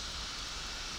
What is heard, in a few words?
Background Noise